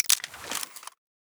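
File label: night vision off; STALKER; IWP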